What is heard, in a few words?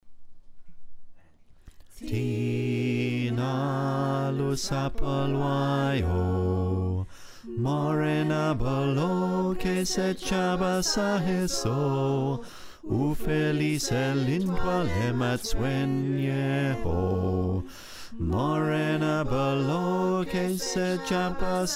Bass, choir